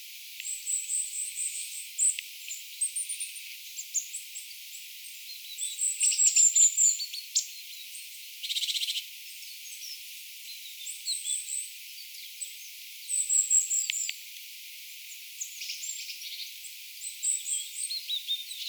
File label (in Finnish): hippiaisten aantelya